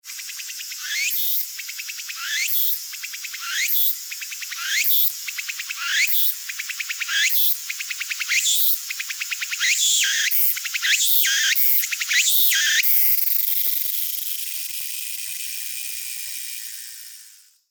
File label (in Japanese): ツクツクボウシ